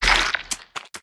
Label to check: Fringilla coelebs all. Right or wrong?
Wrong